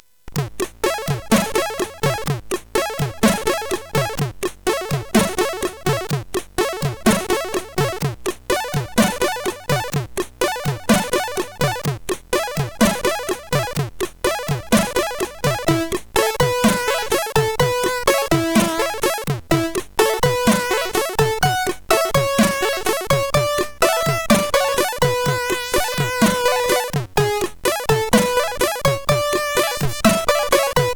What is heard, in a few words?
Bossa Nova, CSG8580